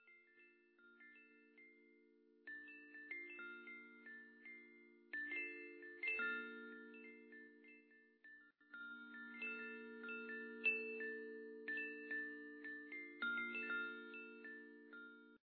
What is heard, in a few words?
Foley; John Go Outside title screen